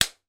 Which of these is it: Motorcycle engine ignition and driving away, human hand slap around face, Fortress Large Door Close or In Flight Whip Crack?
human hand slap around face